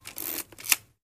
Antique Camera Film, shutters; shutters & movement

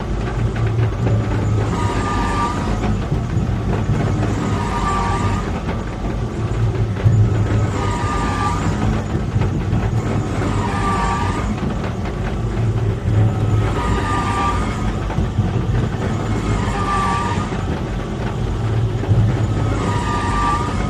Oil Pump Mvmt Creaks, oil rig

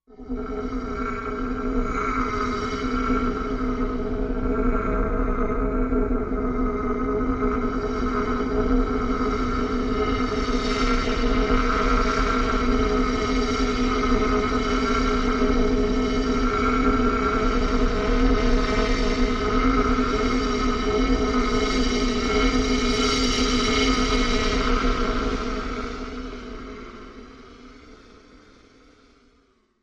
Transmute Strong Hollo, hollow

